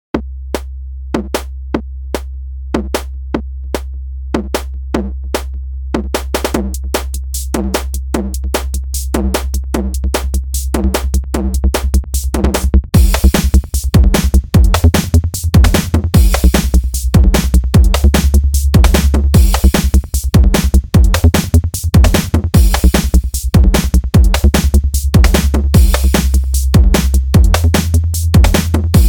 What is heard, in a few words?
Psy and Bass